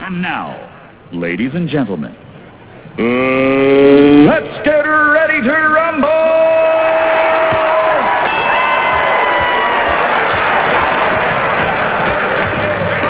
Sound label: rumble